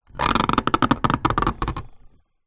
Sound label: foley wood polished run creak hand